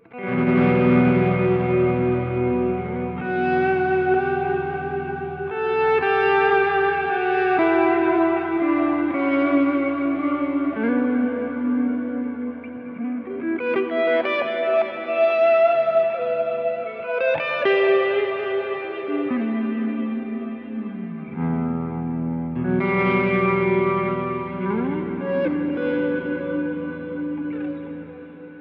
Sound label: Slow Attack; Harley Benton DNAfx GiT Pro